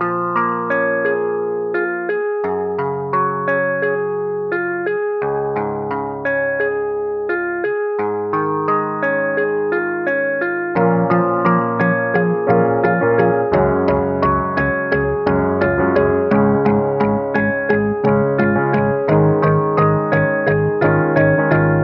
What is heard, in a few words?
guitar, Loops, Sad